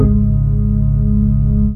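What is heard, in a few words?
synth organ dance; Best Service Gigapack 1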